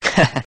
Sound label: Chuckle; Neku